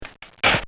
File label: Cartoon Sound Effects Bang; Cartoon Sound Design; Bobby Cole